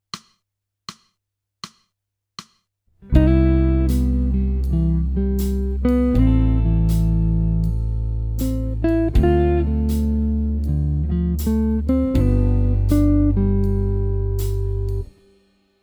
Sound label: jazz